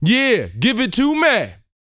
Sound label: VOCAL F; ILIO